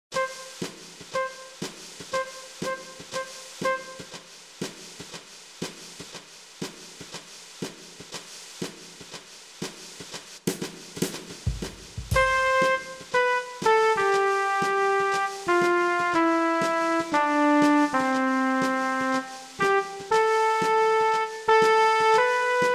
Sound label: Joy To The World Trumpet; Weihnachtslieder